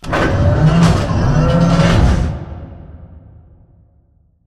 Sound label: Robot Arm Plate On To Conveyor; Boomtown; Titanfall 2